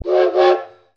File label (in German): Lokpfiff, modellbahn